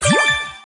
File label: eat; Snake; Game Demo